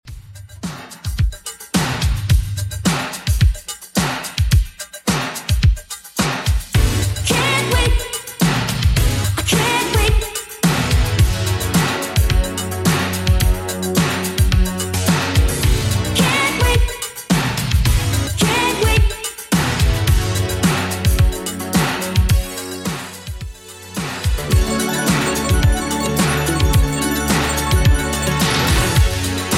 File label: 80s Redrum 108bpm